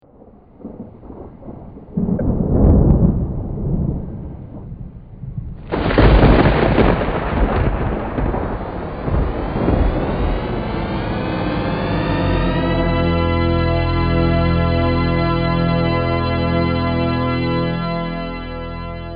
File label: Thunder; Thx